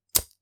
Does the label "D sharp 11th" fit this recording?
No